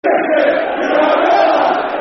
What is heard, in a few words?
Premiership, Football Chants; Stoke City Scfc